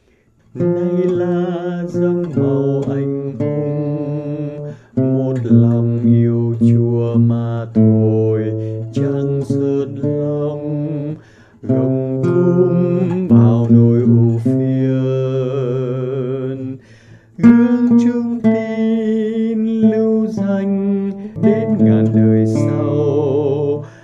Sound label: Bass